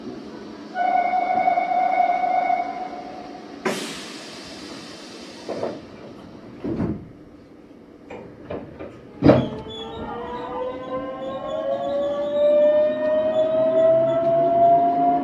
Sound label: vvvf, mitsubishi